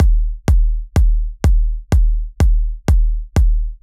Big EDM Kick Loop, 128 BPM; Future House; Future House Tools

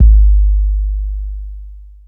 Kick B Hit; Hip Hop Exotica; big fish audio; 102 Ebmin

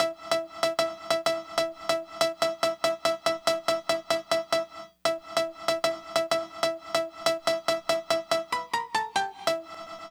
Synth; AppleLoops

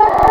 Rooster; Cymatics; Serum; Animals for Serum - Gold Edition

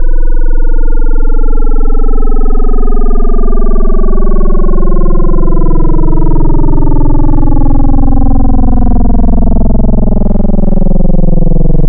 Phasey, wavetables; Cymatics; SERUM; Vibes Wavetables

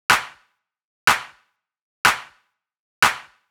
Big EDM Clap Loop, 123 BPM; Future House Tools; Big EDM Drum Loops

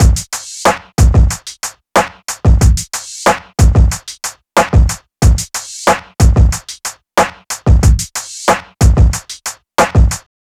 Drums, Hip Hop, 092, Dmin

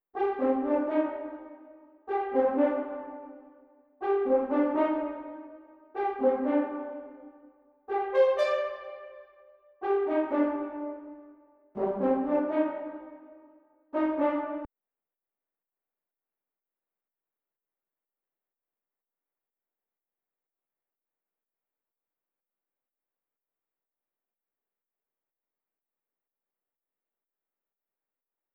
124 BPM C Min Horn; Cymatics; Rari; Destiny Gold Expansion

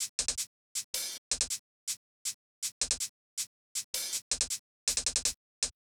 Drums; Hip Hop; Apple Loops; Big Fish Audio

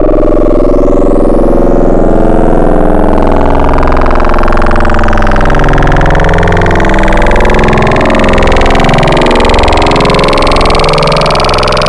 Sin AM, Wavetables; Cymatics; Wavetables Collection Vol 3; Serum

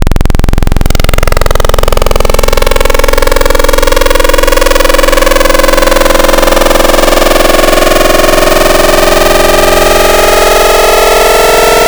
Saw Transformer, FM Wavetables; Cymatics; Virus Wavetables; Serum

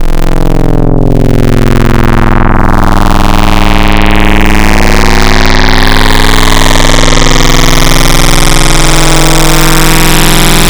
Vintage Death, Wavetables; Cymatics; Secrets Wavetables; Serum

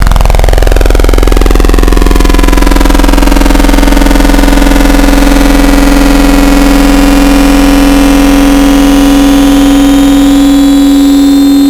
Distort My FM, Wavetables; Cymatics; Jawz Wavetables; Serum

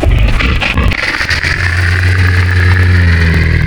Deer, Wavetables; Cymatics; Serum; Animals for Serum - Gold Edition

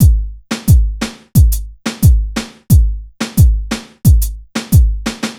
Drums, Hip Hop Exotica, 089 Amin

